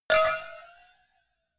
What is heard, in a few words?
cowbell clank hard